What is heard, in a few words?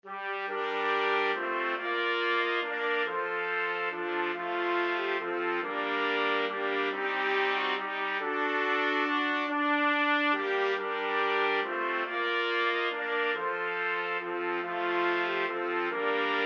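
4 trumpets; trumpet quartet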